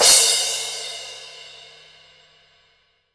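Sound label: cymbal crash; Best Service Gigapack 2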